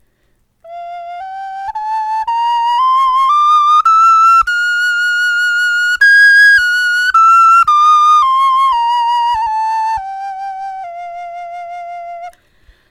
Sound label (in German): tonleiter